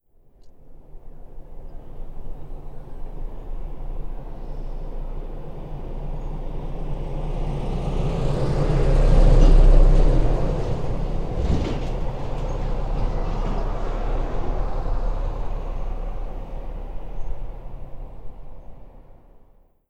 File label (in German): Strasse LKW Vorbeifahrt Atmo